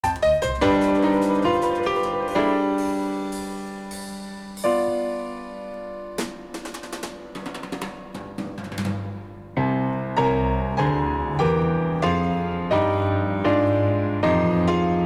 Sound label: solfeggio 1200 G